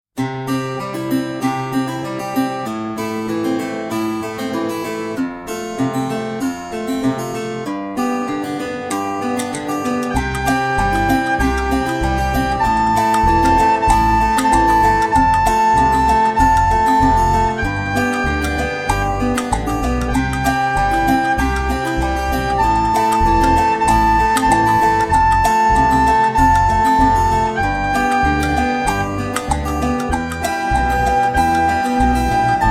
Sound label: Country Life lent